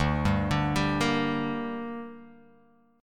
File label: D Suspended 4th Sharp 5th